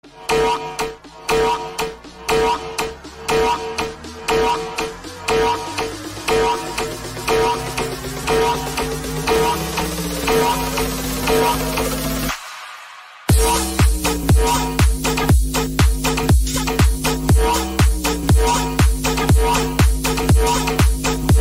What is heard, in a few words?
Alarm Remix Italian Ringtone